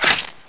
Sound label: knife hit body